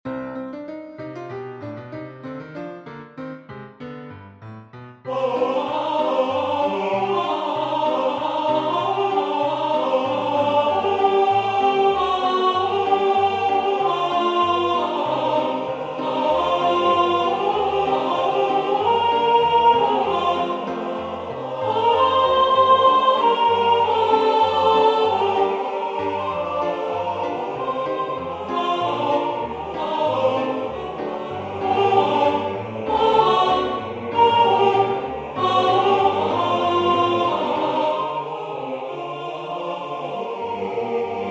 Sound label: Alto